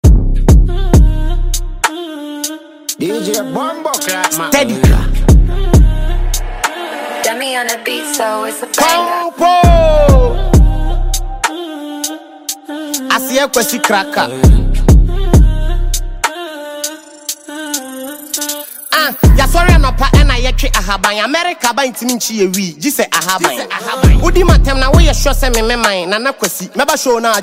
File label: Freestyle Riddim